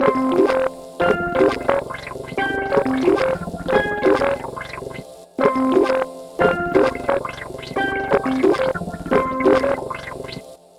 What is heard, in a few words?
Melody, Hip-Hop